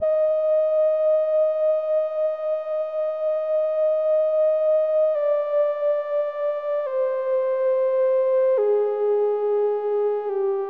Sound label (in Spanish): soprano